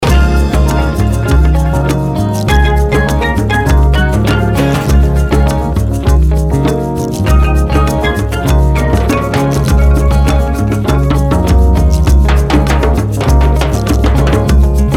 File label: World Music Medley